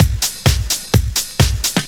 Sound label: Straight Loop 128 BPM, House